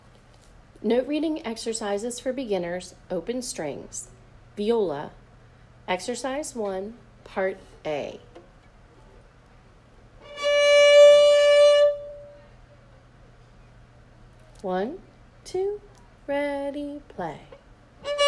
note reading exercises for beginners open strings viola